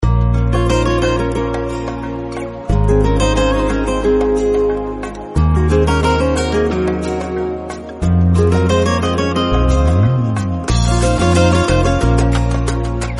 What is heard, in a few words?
persian music